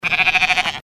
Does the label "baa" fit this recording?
Yes